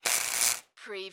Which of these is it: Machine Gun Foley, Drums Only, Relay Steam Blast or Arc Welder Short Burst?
Arc Welder Short Burst